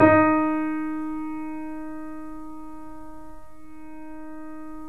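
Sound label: D#5, Bösendorfer, 3D Pianos; E-MU Producer Series Vol. 5; 3-D Audio Collection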